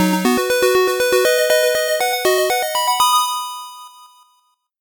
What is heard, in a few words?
8bit jingle normal